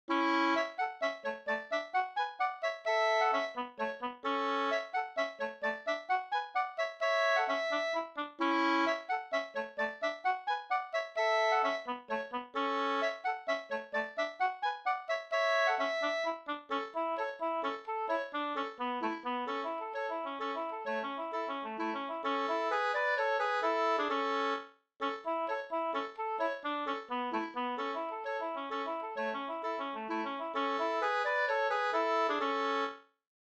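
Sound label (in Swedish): polska, tvåstämmig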